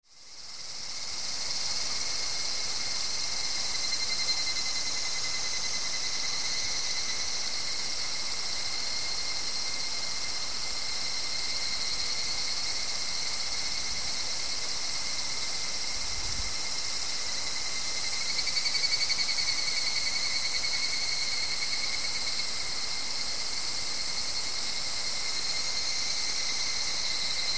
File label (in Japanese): kuroiwa higurasi